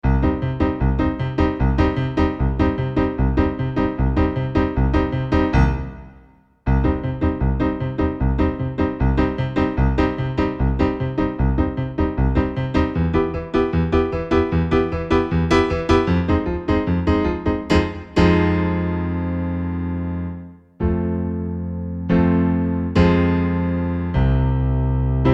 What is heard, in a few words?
piano; vegane oper; chor